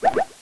laser bubbles, sci-fi

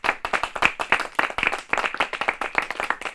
group applause, small group applause

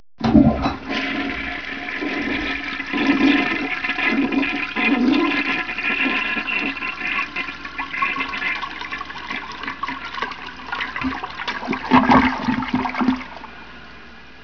WC, toilet water flush

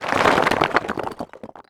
rockfall

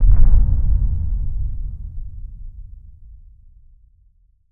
deep space explosion

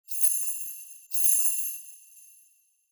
sleigh bells

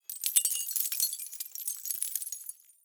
glass debris

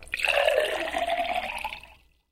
glass water fill; glass filled with water

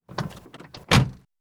car door close

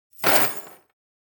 metal chain drop

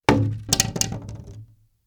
plank drop, wood plank drop

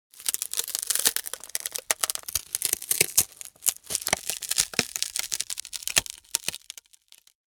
tree branch break

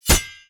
sword cut; cooking

